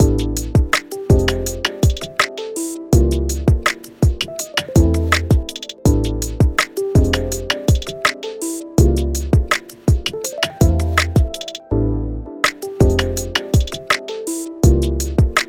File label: Hip Hop Night